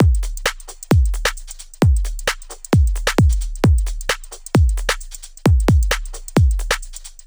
132 Garage; Touch Loops; AmbientDubMini

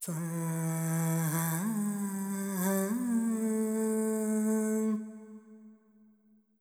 ETHNO FEMALE, Vocals; ethno2

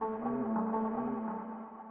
ethno, 125, A

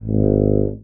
Contrabass Tuba Straight G#0; DirectWave; Varazdin Orchestral

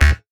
perc; Anthemic.Electro; drum hits

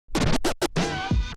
OLDSCHOOL SCRATCH 96

